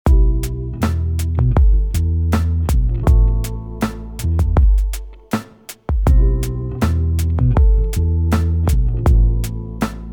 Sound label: loop sad